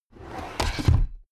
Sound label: drawer cabinet crammed close heavy